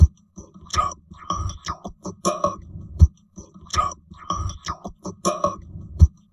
TALK, Drum Loops Crazy Processed

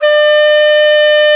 DIZI, Winds; E-MU Producer Series Vol. 7 – Old World Instruments

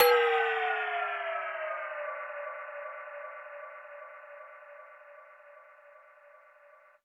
U220 FX Set, Drum Machines; Roland U220

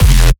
bass hit blasting E; Virtual Riot

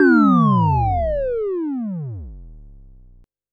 Sci fi

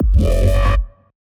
FM Tone SFX; neuro; BIG5AUDIO

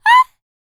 Vocal, R&B & Dance, FEM. HUMAN

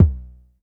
Polite kicks long B1; LIMAT Div Dept; Drums

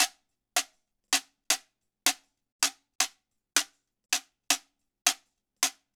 Quiro, Latin Percussion

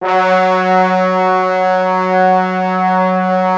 F#2, Orchestral Brass; Best Service ProSamples vol.20 - Orchestral Brass; AKAI

